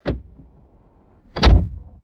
interior car door shutting firmly; vehicles

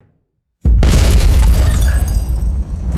punchy explosion with shattering glass

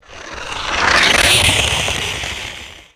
small firework rocket launch whoosh

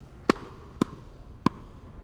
indoor tennis ball hit and impact; sports

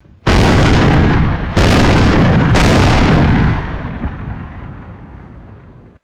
three heavy naval cannon blasts; guns

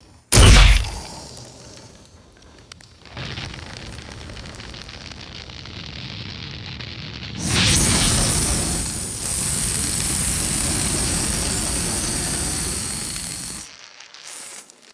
sharp crack ignites airy crackling flame; fire

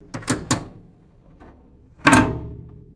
metallic boat door latch clicks and clunks; boats

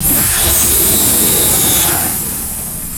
loud hissing pneumatic suction; machines

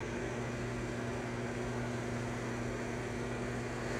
steady fast exhaust fan hum; ambience

